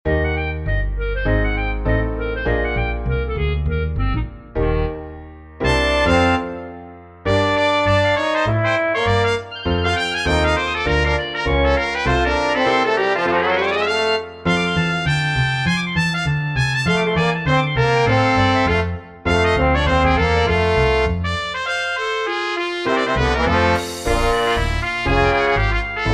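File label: dixieland outros, Traditional Jazz Combo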